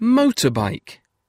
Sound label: motorbike; transport